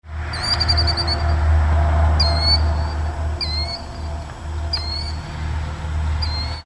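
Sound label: killdeer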